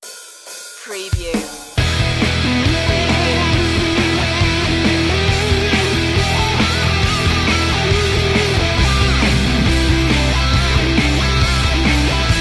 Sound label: hot rocker sting